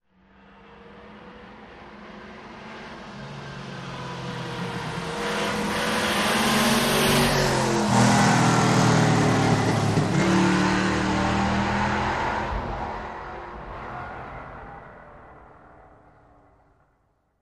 Car Sports Car Medium

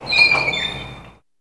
Door Metallic Squea, push bar

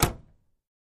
Camaro Door Close, chevy camaro

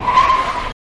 Car Tire Screech

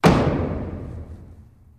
Gavel Beefy Slow; gavels

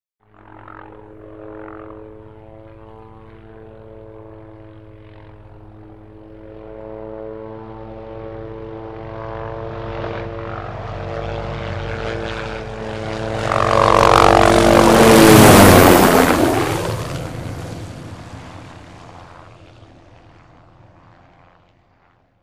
Hughes 500 Single By, hughes 500

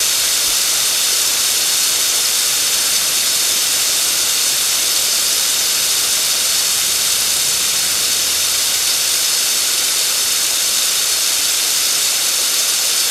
Rainbird Spewing Out Water, No Clicking, Loop

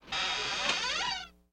Door Squeak Creak; hinges & creaks

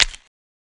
Celery Generic Snap; gore stabs & eat

